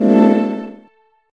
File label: lvl Up